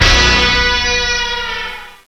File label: brass slide; Rubber Chicken Software Sample Bank